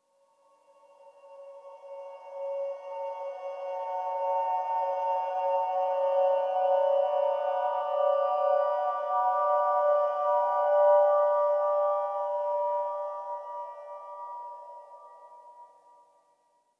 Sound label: Water Girl Above Water Horror; inside